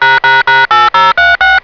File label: noon alarm